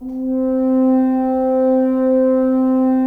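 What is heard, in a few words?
Solo French Horn, Brass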